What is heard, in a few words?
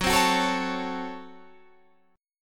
C sharp Major 7th Suspended 4th Sharp 5th F sharp